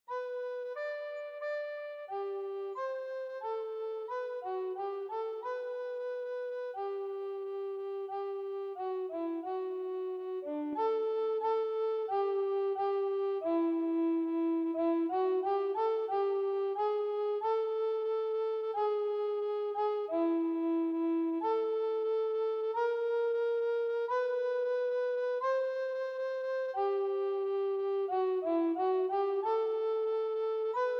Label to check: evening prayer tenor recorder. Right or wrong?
Right